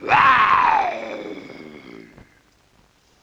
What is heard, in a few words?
LoFi Synthetic Lion Roar